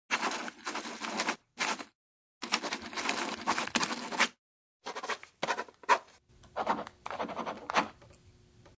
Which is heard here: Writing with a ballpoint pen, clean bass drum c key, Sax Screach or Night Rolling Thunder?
Writing with a ballpoint pen